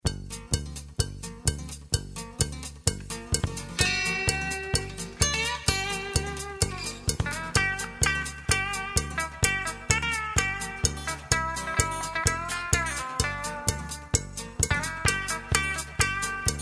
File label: music